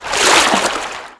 Enter sea; Melonbra

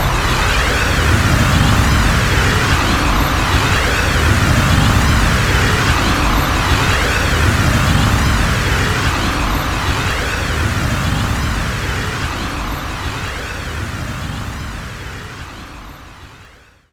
Enemy Bigclam Active Loop

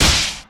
Ball Hit Killer

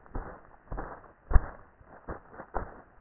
Deep footsteps

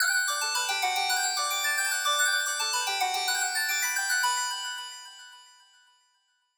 Fill Synthbell Melody 220 E; exciplex sample presets pack; JinoBeats

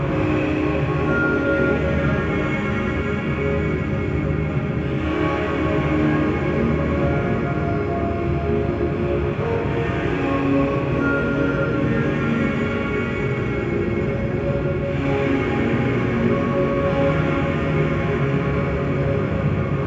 Song Shop Empty

